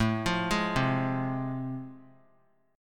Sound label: A Minor Major 7th Sharp 5th; arpeggiated; AmM7#5; 21,24,23,22; JGuitar